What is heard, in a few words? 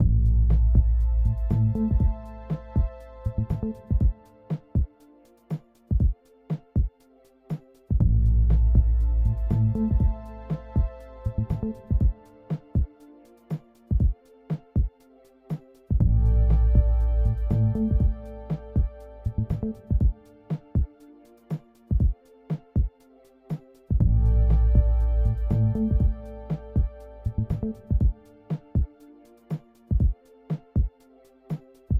ambient journey bass; Sabotain Break the Rules